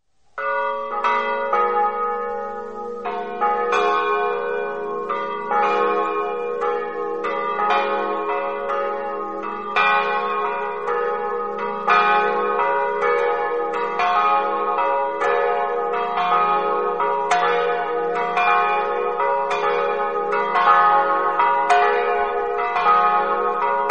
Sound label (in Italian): campane; oleis